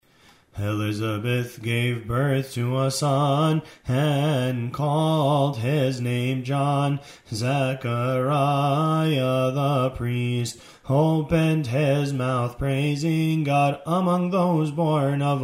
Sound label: gospel response, hymns